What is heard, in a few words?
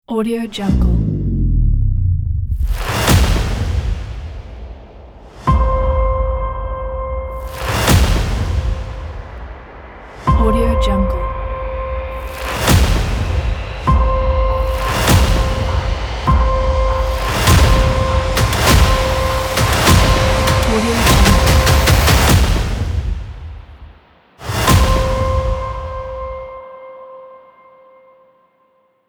cinematic trailer teaser